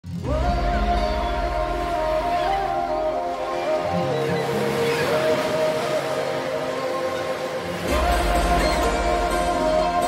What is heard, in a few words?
Song Ringtone